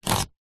leisure foosball plastic score slider single slide